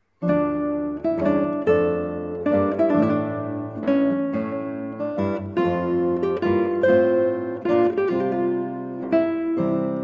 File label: Siciliana from Recorder Sonata in F Major, Guitar; HWV 369; George Frideric Handel